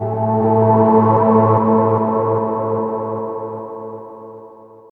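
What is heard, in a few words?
SYN-FX, synth; E-Lab; X Static Goldmine 1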